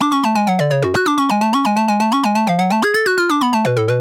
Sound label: Tense Alarm